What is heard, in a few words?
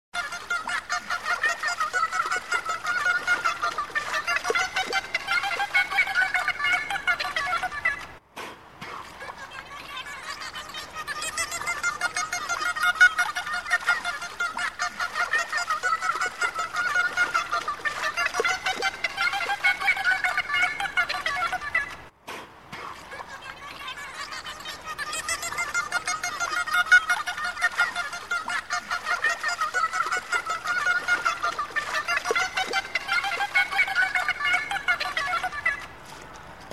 dolphin; animal sounds